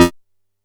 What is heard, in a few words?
F3, Bass; E-MU Producer Series Vol. 6 – Analogia Project; Boom da Bass